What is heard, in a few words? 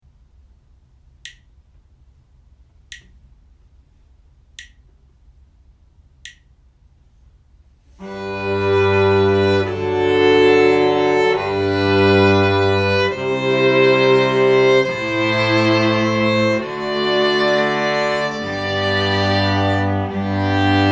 harmonic error detection; ear training